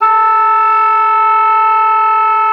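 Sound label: oboe d'amore; Xsample VOL-7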